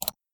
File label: Mouse Click